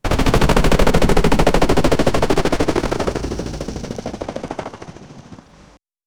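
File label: cinematic sound helicopter; 5 sec; vfx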